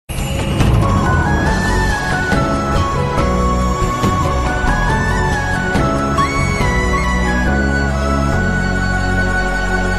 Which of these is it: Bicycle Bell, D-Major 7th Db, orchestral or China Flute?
China Flute